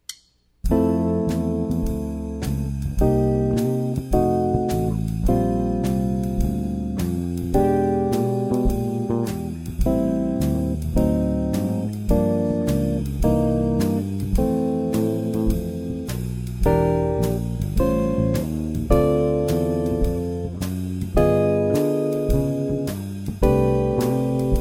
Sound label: Example of Altered Dominant Chords, guitar